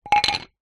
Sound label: foley wood small block thin light down on hard ground; zapsplat